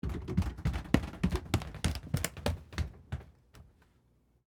Carpet Stairs Up, Footsteps, Carpet, Stairs, Up, Underneath, Perspective; Footstep Sound Effects